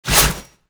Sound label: attack launch; Li Li Shadowpaw Sound Effects; Li Li Heroes of the Storm Responses; warcraft